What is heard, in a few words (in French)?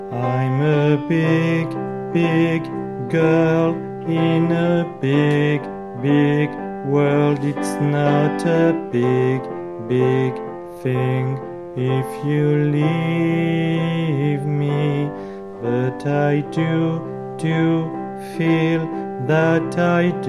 Refrain Alto